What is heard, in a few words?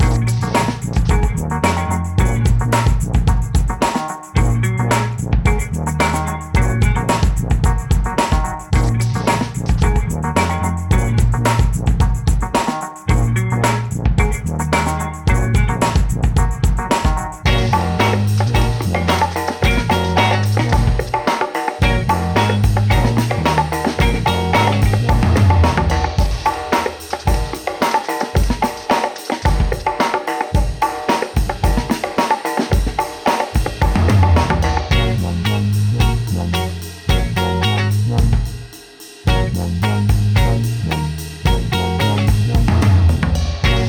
Metal Guitar; rock